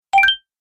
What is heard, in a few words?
Gameplay Bonus, video game